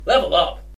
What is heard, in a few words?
Level Up